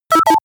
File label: notification bleep